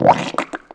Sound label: toxic slime gurgle; ambient; Portal 2